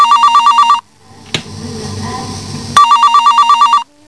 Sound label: RING